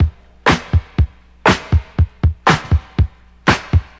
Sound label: 120 bpm hq breakbeat e key